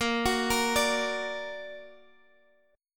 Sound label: A sharp Augmented, Guitar, DADGAD